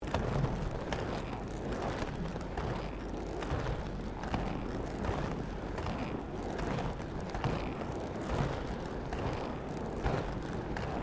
Rollerblading Bitumen Smooth Loop, Rollerblading; Sports

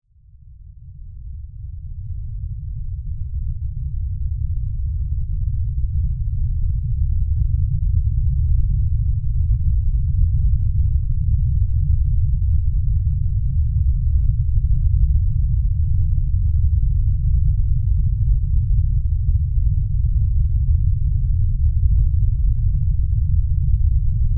Earthquake Rumble, Deep; Weather

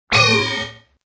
Alarm Hit; Multimedia

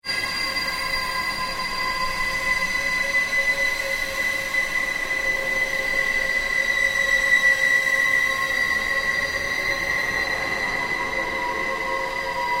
Ambient Drone Ghost Chatter Scream

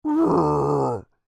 Creature Speak Moan Upset, Human; Groaning; Voice; Big Room Sound

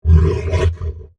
Monster Vocal, Large, Creature; Horror, Monster, Vocal, Element; Monsters

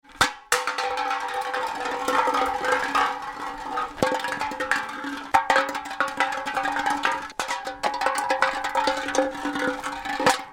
Empty Tin Can Rolling And Being Kicked; Along hard ground - Various; Rolling Sound Effects

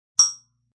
Clink, Metallic; Metallic Sound Effects

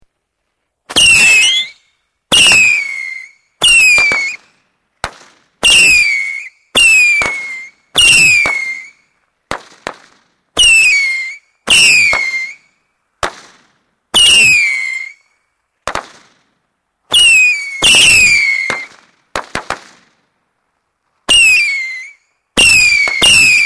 Fireworks Screamer Multiple, Domestic Fireworks; Fireworks (Sound Effects:Backgrounds)

